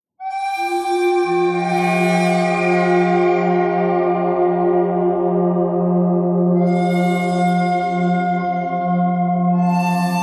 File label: bird thread buzz all; birds